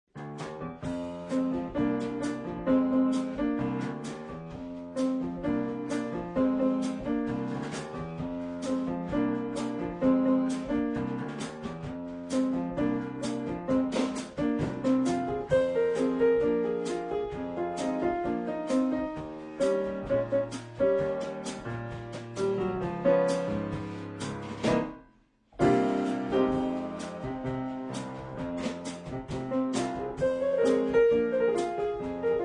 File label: piano drums